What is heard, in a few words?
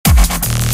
Dubstep